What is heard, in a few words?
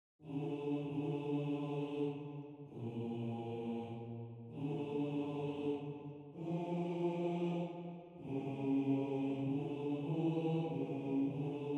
Bass